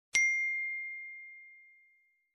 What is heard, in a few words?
iphone sms tunes